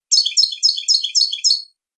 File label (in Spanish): floreo bachi bachi, campero